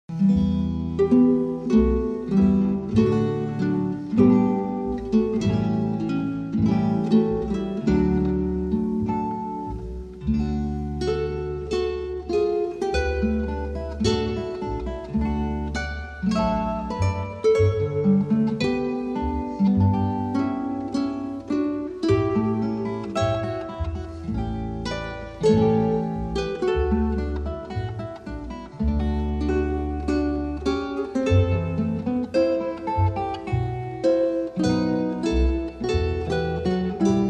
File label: GUITAR SOURCE